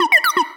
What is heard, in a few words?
Sci-Fi Lasers - Droid Talk; Antidote Advent